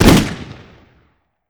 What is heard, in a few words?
golden gun fire; weapon; npc